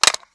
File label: glock mag place